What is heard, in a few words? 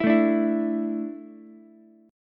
A Suspended 4th A down Ukulele; Asus4 strummed; JGuitar